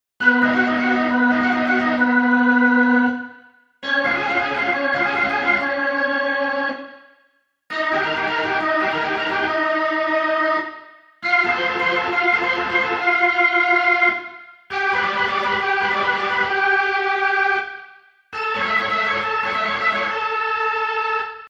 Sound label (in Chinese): 主场风琴上行合集; 战中; 战斗音效; nba